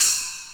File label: CYM, DRUM ZONE; Masterbits - Total Zone